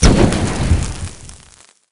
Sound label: imp exp; doom3